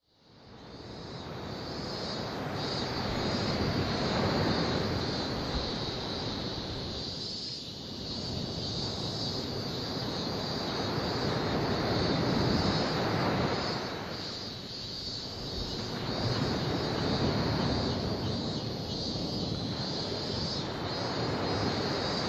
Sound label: Sea of Japan (Ambience); The Great Ace Attorney Chronicles